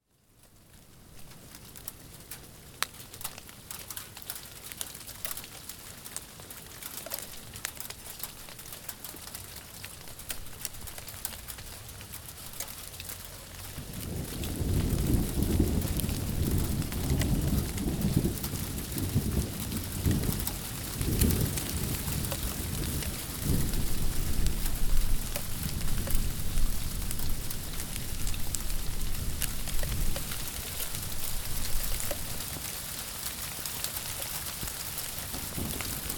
nature sleet freezing rain thunder

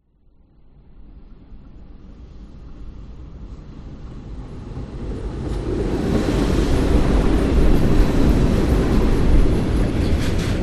deep rattling freight train; the sound pack tree

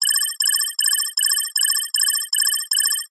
science fiction console alarm

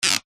wood rub squeak short; foley; zapsplat

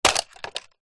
foley wood hollow small break open; zapsplat

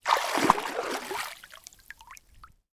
water splash or movement

